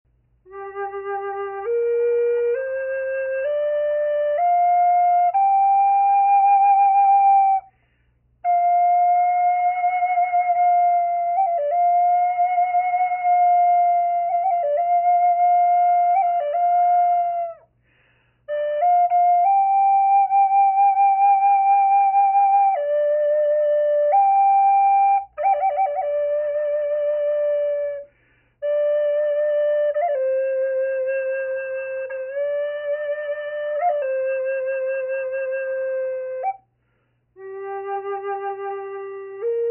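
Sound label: natural, flute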